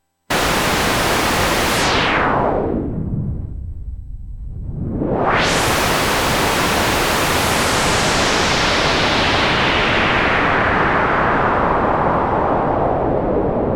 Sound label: noise